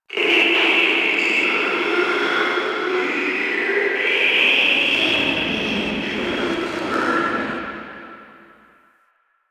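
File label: Infested Distant; Ambience; Warframe; Nineteen Ninety Nine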